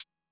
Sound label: hat; MFB-522